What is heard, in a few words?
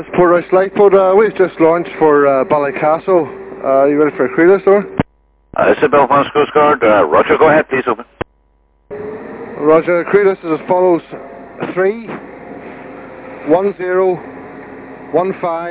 launch; VHF; Ch16 Portrush Lifeboat Mar 2008